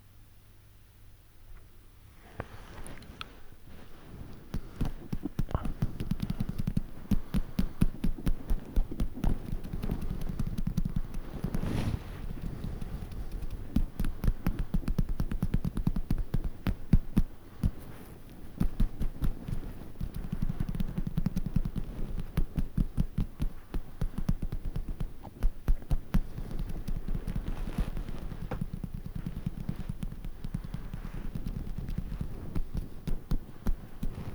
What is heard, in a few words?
whale